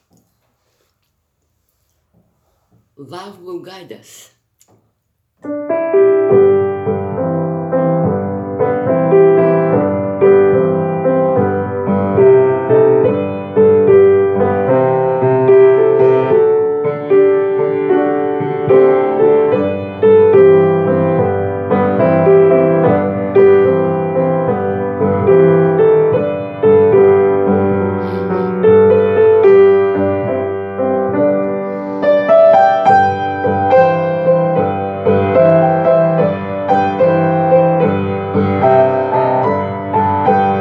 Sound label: Love Will Guide Us, pianist